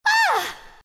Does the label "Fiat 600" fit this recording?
No